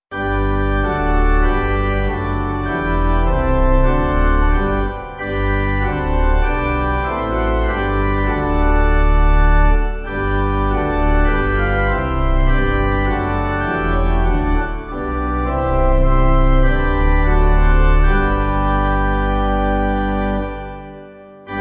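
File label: Pipe organ, church music backing; Our Father Hear; Aberdeen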